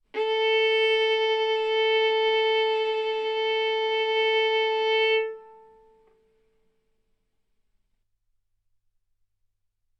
Violin arco A4 B4; mf; sul A; stereo

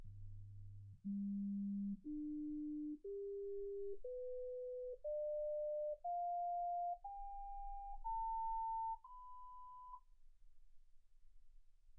sinus tones 48kHz

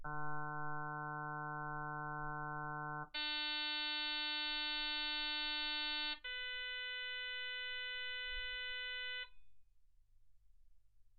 harm sinus 48kHz

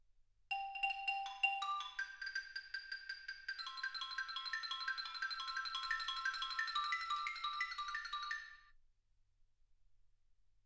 xylophone

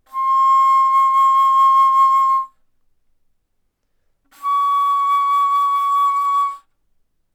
Bass Flute ff C6 Db6; OmniPower4296

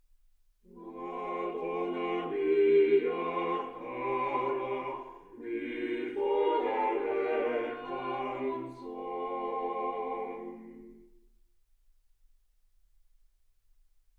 quartet

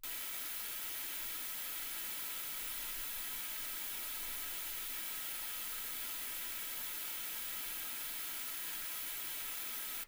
wgn

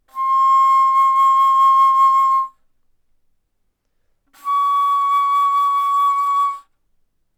Bass Flute C6 Db6

